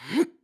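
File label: Lift, voice; Link lifting an object; BOTW; Breath of the Wild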